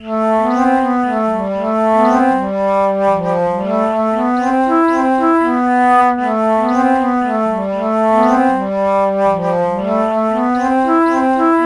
alto flute alto flute melody morph low

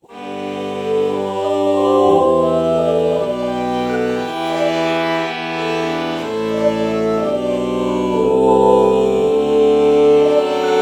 oh violin lpc true bach morph

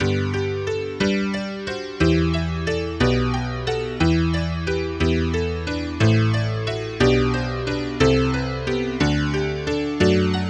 honky tonk

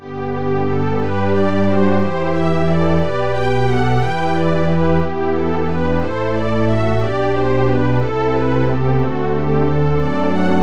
sweep pad

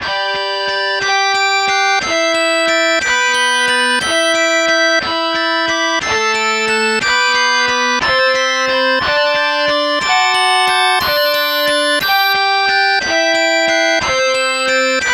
guitar harmonics

